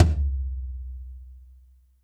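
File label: TOM loud; Zero-G; Chemical Beats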